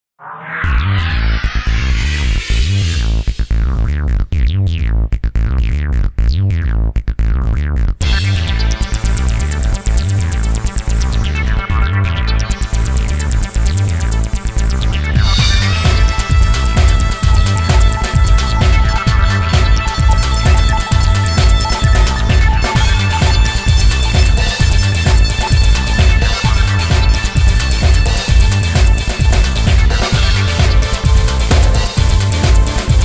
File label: galactic timer